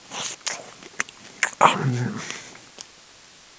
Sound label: small medkit; svencoop